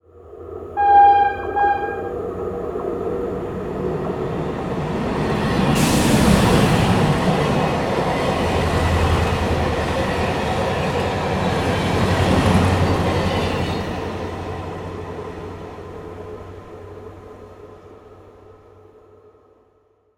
train pass by with whistle; transportation

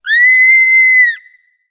woman scream; human

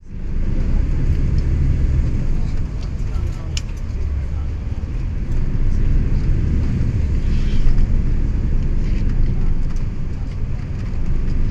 bus interior, ambient

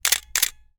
camera shutter click; mechanical

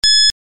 beep

